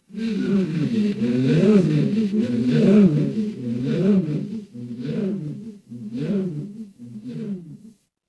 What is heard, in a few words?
crowd gasp